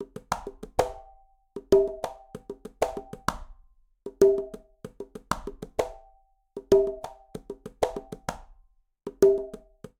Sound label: Songo Quinto 96bpm, Latin Percussion; Ableton; Factory Packs